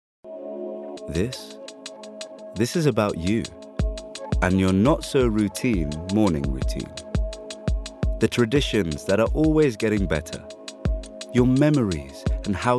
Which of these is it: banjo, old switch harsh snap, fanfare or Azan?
Azan